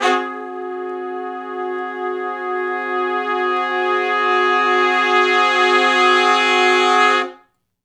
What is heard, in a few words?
2 ms Long Note w Swell (Ab) har-dom 7th, Horncraft for R&B; Sonic Foundry (Sony Creative Software); Sections